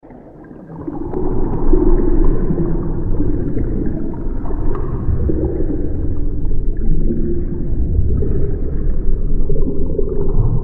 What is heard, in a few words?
Ambient Abyss; Orchestrion